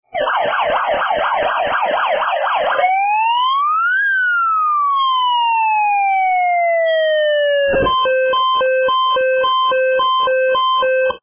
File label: four alarms